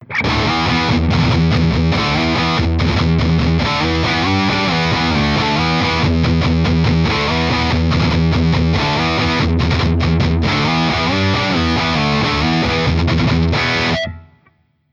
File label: metal